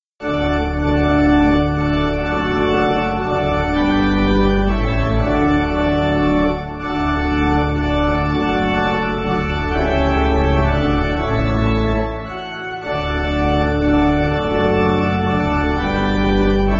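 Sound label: Jehovah Never Forgets, pipe organ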